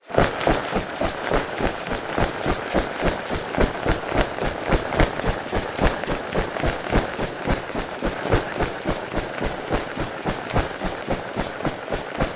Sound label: foley raincoat running movements; zapsplat